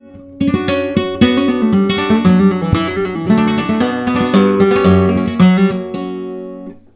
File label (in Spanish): falseta